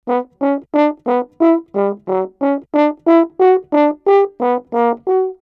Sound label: 90 Bmin french horn; 90 s Sample CDs; PowerFX - Downtown Orchestra; Brass